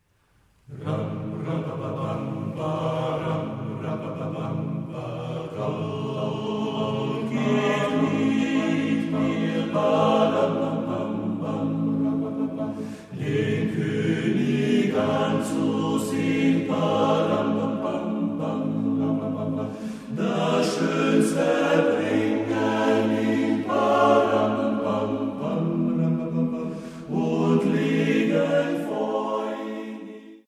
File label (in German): Trommellied